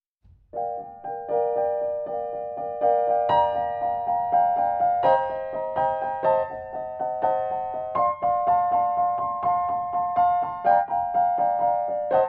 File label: Jazz